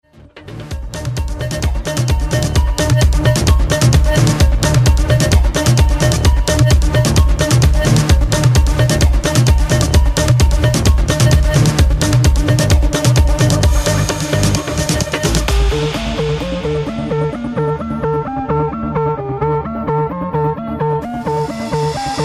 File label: dance music